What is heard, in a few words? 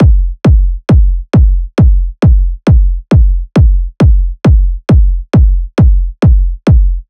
Kick Loop 135, Techno Drums; Ghosthack; Techno Sounds 2025